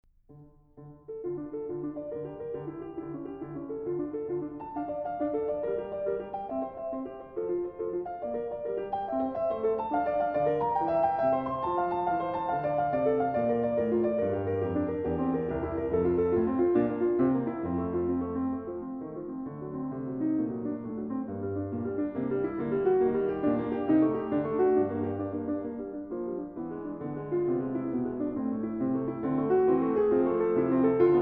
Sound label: Prelude in D minor, The Well-Tempered Clavier; BWV 851; The Well-Tempered Clavier, Book 1; Angela Hewitt